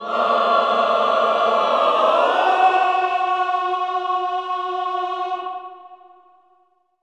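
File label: choir cluster; Sound & Vision - Gigapack I CD 2 (Roland); Cluster 1-9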